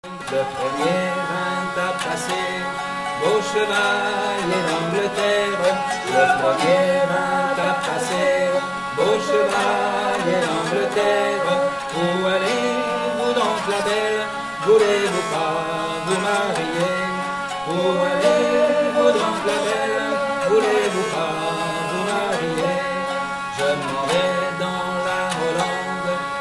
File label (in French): Mazurka; Sur Le Pont De Lyon